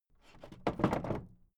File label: old wood case wobble